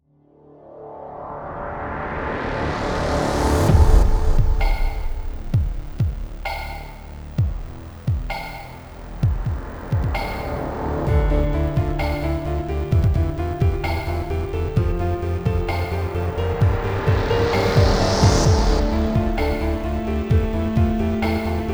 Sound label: Cinematic Trailer Music